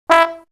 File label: Wind